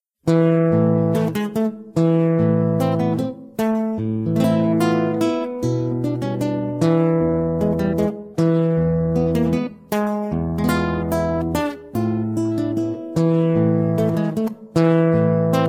guitar; STALKER

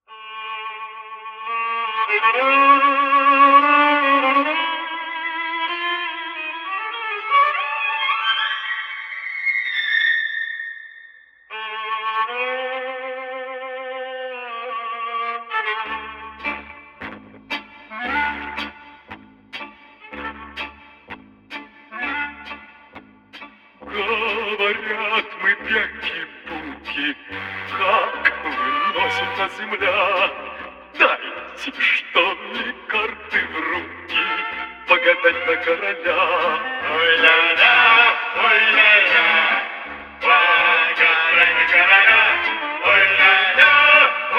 radio music; STALKER

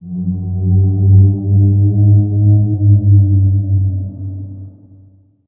mghost idle; STALKER; customnpcs

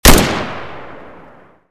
sks fire; STALKER

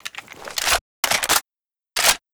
galil reload; STALKER

